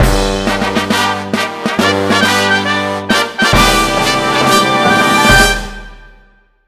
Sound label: gameclear